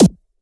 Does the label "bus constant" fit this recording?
No